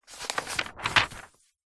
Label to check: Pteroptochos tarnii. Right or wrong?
Wrong